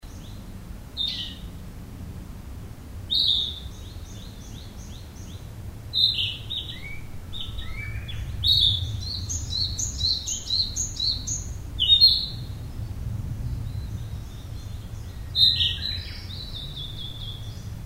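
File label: kibitaki, narcissus flycatcher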